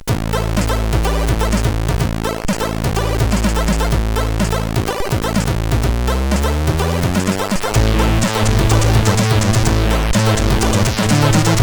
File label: Tune, CSG8580R5; S2-Tune; hvsc